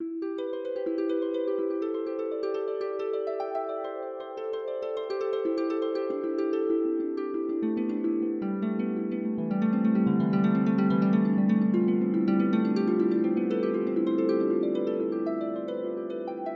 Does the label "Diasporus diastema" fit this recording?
No